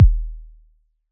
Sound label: KICK, DRUM HITS